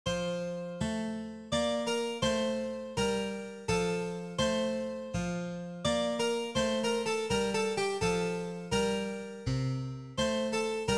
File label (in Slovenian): Menuet v F-duru